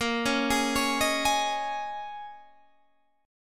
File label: D sharp 7th Suspended 4th A sharp, Guitar